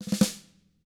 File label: RUFF, SNARE; SNARE ROLLS; ILIO; Double Platinum Drums 1